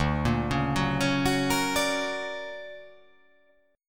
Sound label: D Augmented Major 7th down, guitar